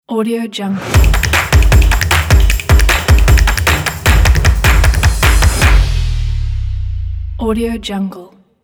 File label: drums logo